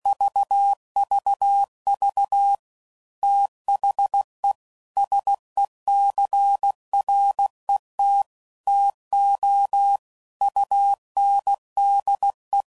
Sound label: CW Code Groups 15WPM; PwrSupply; HamRadio; Morse Code; Technical Subjects